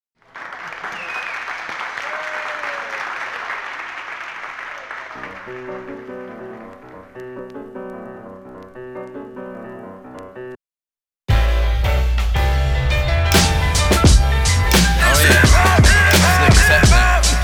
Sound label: hip hop interlude